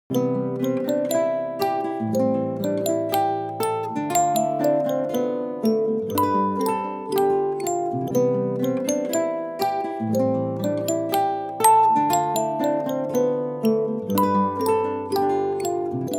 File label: leisurely, alarms; OnePlus 5T Stock Ringtones